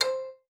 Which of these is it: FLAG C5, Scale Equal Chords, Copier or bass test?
FLAG C5